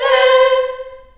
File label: C4 CHS EH, CHILD EHS, Choir; USB Soundscan vol.28; Choir Acoustic & Synth; AKAI